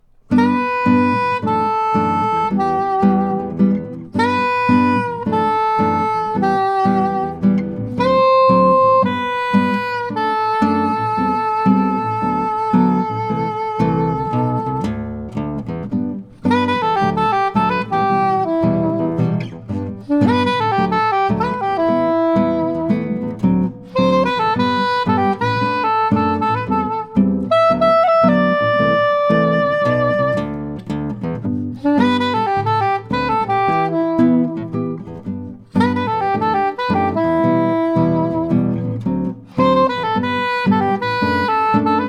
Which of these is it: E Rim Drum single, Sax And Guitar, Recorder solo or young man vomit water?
Sax And Guitar